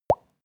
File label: pop bubbles; soundpool